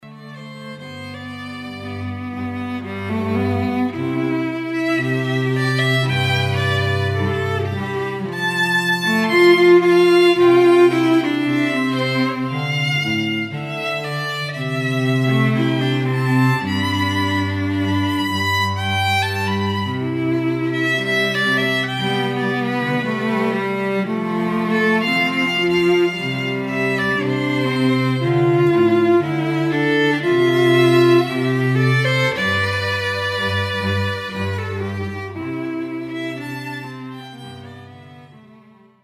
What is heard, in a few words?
String Trio